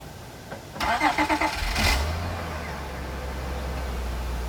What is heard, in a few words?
troubling starting sound acura tl cold start; 3g tl